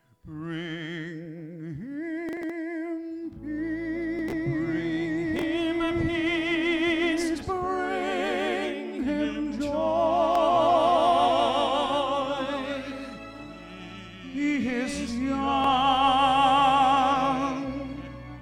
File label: Singing Waiters